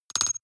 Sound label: noise metal hits splutter; maschinen; signale; soundpool